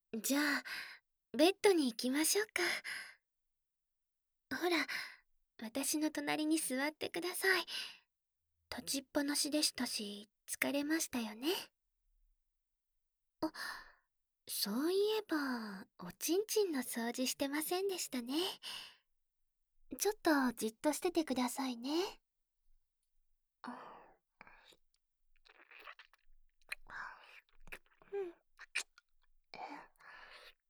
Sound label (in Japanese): ベロチュー耳舐め, ASMR; ベロチュー耳舐め本番; 効果音あり